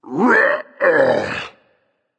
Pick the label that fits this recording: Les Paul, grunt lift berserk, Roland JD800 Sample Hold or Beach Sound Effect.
grunt lift berserk